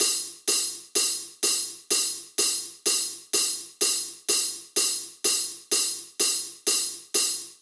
126BPM Cubic Kit; Vengeance Samples

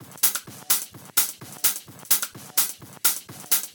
House Loop, 128 BPM; Vengeance Samples

